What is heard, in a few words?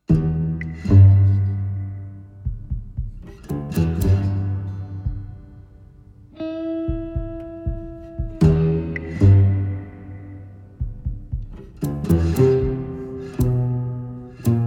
Love Western Comedy